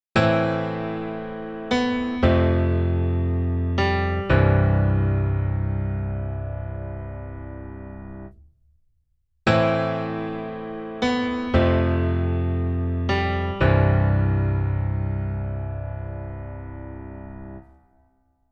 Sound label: PIANO Subtle Hall Ambience